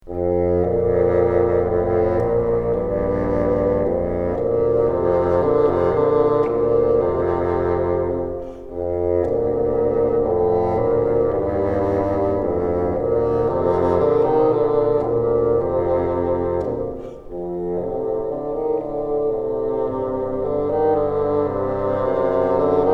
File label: together, bassoon; New Note